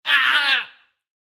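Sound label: Injured Damage; Bronzo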